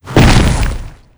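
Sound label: angel warrior attack, monster; lava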